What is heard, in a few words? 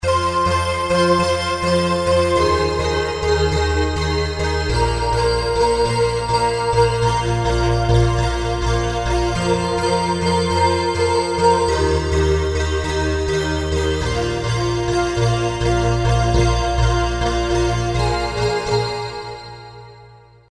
korg m1r synth